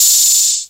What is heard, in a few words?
OHH, Roland JX-305